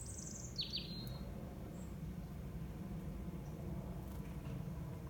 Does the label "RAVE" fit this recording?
No